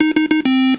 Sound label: beep